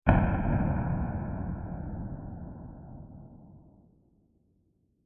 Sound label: Huge Bright And Diffuse Explosion; Explosions; CraftedSignals